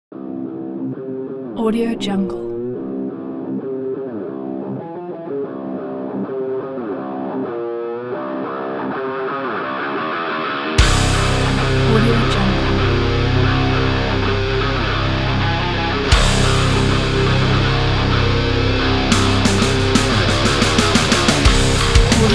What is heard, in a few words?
metal; hemlockrecords